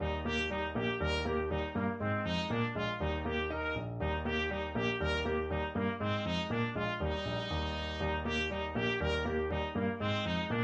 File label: ecossaise trumpet; hummel ecossaise